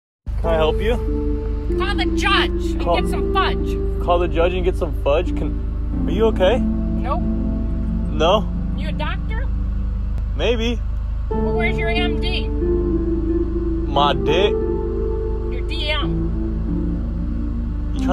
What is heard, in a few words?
parking lot; eastern-continent; highway; dennys